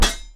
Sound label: Armor Hit; Anarchist Mod